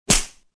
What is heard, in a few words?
miss; mine